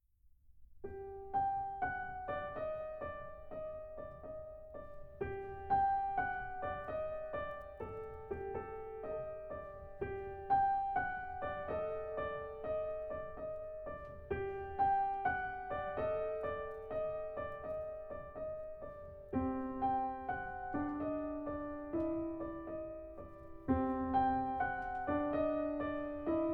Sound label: Piano Quartet